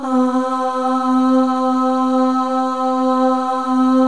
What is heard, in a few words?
Voices, FEM AHS; Spectrasonics; FEM.1 AHS